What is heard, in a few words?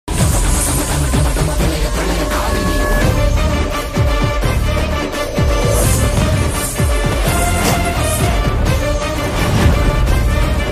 teaser bgm, trailer ringtone; pushpa 2